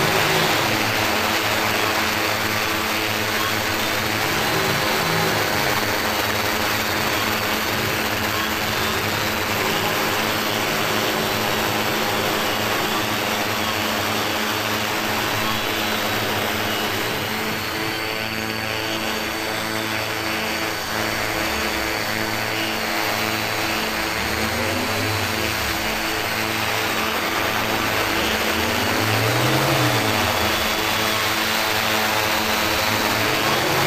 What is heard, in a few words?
Worker Drone Hover; Titanfall 2